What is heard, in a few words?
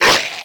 zombie death